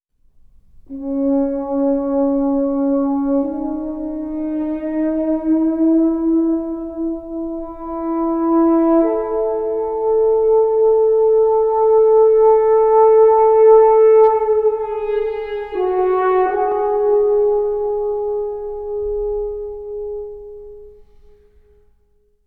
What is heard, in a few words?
Horn Solo; Title Cards; Battlefield 1